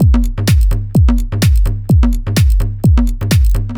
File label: G 127bpm, House Drumloops; Dubai; Zero-G House Fabrik; 90 s Sample CDs